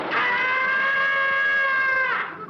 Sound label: Screaming